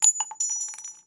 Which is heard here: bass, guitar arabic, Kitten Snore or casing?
casing